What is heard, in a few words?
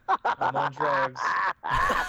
delirious laugh; taunts; hunters